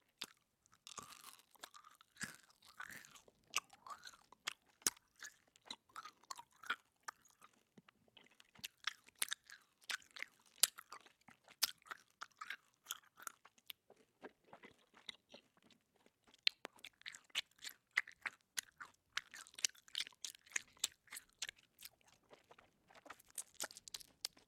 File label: Chewing Gum Open Closed Mouth